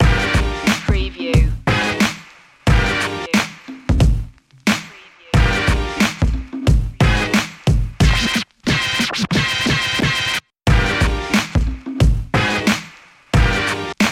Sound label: boom bap loop